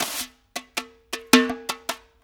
Blues Perc Loop; Drum Tools

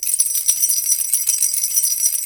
BEL RATTL, African Percussion; E-MU Producer Series Vol. 7 – Old World Instruments

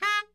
BARI FF G#3, saxophones; Greytsounds - Philip Wolfe David Crozier's Saxophones; BARI SHORTS

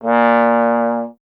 Trombone A#0; Miroslav Vitous - Solo Instruments VOL-1; 90 s Sample CDs

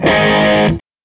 Tele Guitar Loop; Sonic Foundry (Sony Creative Software); Six-String Orchestra

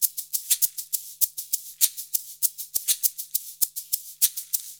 Modern Percussion Loops; Soundscan vol.56; AKAI

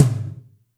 TOMS; Zero-G - Chemical Beats

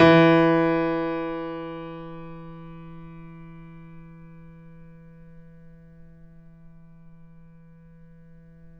Mf E 2, Grand Piano off mic; AKAI S6000 CD-ROM - Volume 2

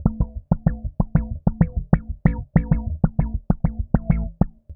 FILT BASS, Electric Basses; PlatinumPhattCD2; E-MU Producer Series Vol. 8 – Platinum Phatt

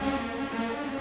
Orchestral Loops; 128 MANHATTAN

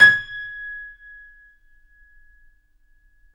KEY, Steinway ff; Roland L-CD701

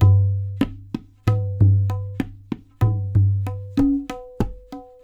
LOOPS, Percussionworks; Sampleheads; NYC Percussionworks; 90 s Sample CDs

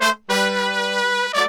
100, BRASS SAMBA

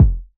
KCK, Roland SH-09 synth drums

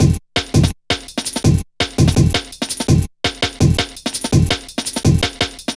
Played, Drum Loops 166.5; Zero-G Jungle Warfare 1, 2 & 3

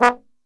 B 1, Brass Super Section, TRMB SHOT; Best Service

